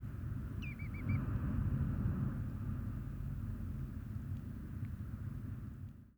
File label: four note